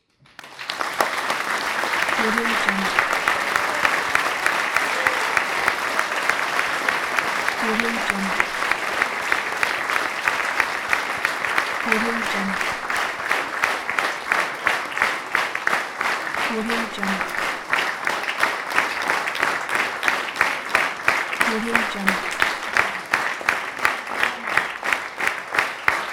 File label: long applause